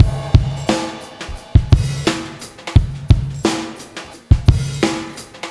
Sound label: 87 Beats, live breaks